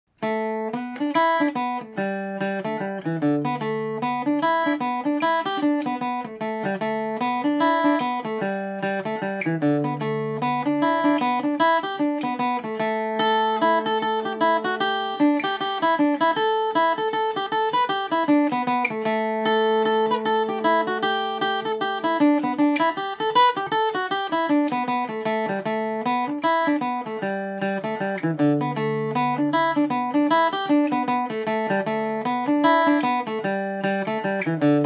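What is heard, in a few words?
A dorian at 40, irish mandolin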